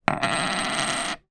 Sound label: foley money australian coin x1 down on hard surface